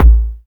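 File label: BD Sub, bass drum; Takebeat; Club; VEC1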